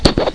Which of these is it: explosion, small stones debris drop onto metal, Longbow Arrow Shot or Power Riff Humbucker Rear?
Longbow Arrow Shot